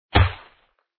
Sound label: sheep attack contact bottom